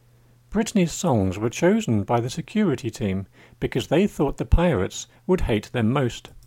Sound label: loud music